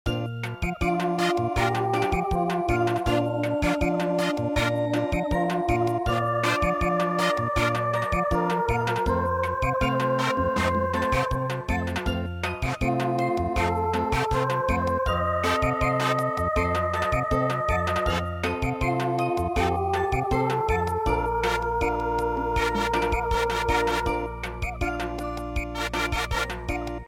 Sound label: Samba; EVENING CHIMES